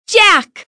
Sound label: card J